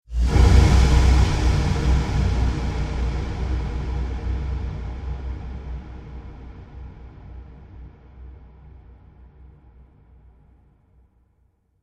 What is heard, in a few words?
Cinematic Dark Hits; Hit Sound Effects; sound design